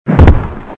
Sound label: dino stomp